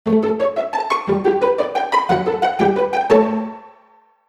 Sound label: short positive